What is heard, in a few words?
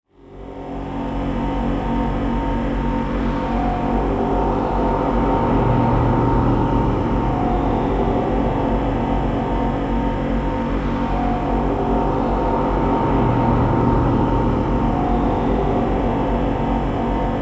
Drone Dark Mechanicle Reverb; Sound Design; Blastwave